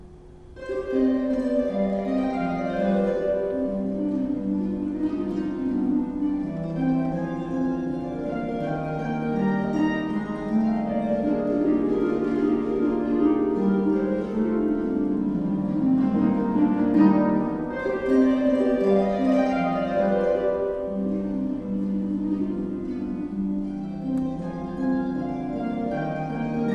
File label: Sonata in C Minor Presto, harp; Pescetti